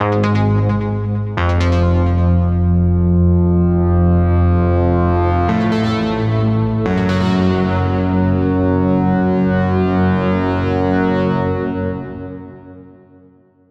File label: Lead; neuro; InsideInfo